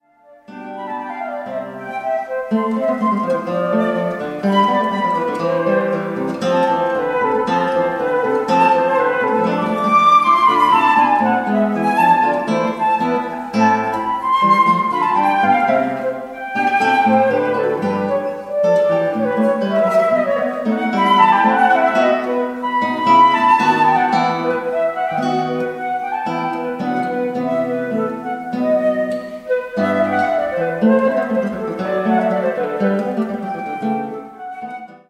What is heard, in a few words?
a moll Allegro